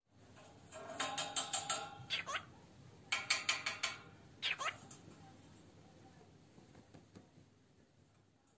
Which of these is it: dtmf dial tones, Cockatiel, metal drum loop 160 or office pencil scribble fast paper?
Cockatiel